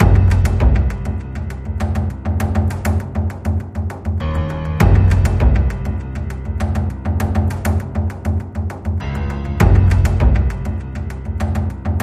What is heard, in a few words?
Clockwork, Loop